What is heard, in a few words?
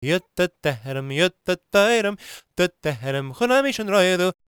GAELIC, World Voices; ILIO; Vocal Planet